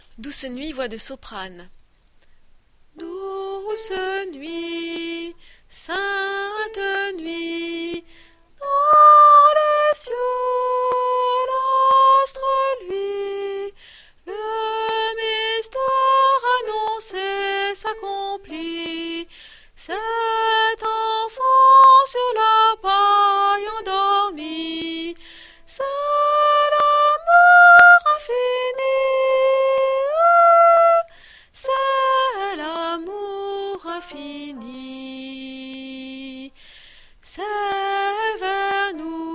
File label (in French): Soprane, voix par voix Noel; Douce Nuit